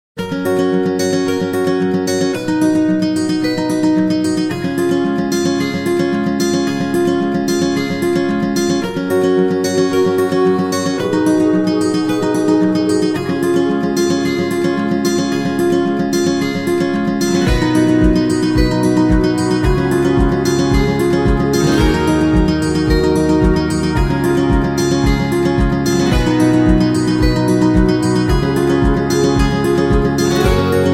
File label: Emotional Acoustic; happy music vol 7